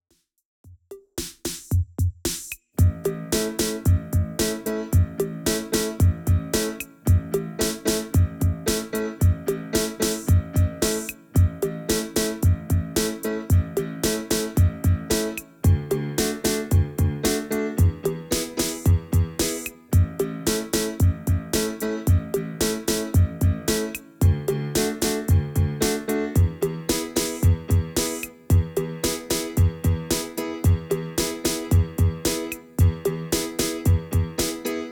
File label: Drum Set INSTRUMENTAL